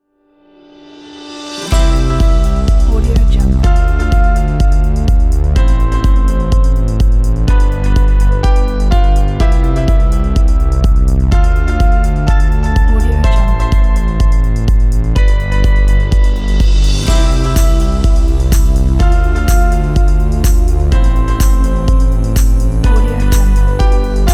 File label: uplifting inspiring motivational; musimaker